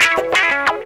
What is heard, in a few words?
GTR RIF; E-Lab; X Static Goldmine 2; akai